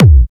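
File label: Cool bass drum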